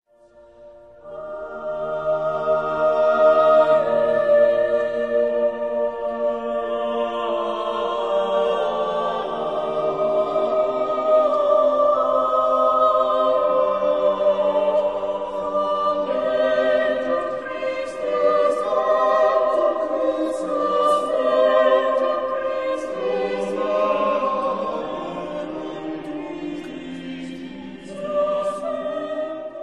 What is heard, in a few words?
motet for 5 voices; Victoria; Cum beatus Ignatius